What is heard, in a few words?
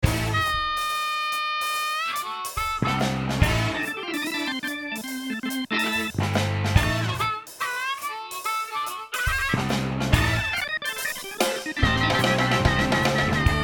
Plays Organ